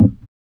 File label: PERC